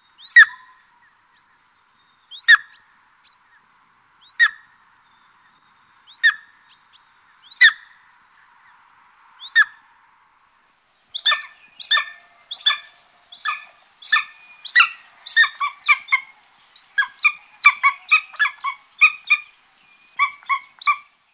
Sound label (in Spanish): aguila real